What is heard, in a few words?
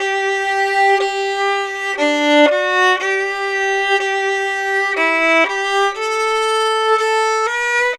Fiddle, Appalachian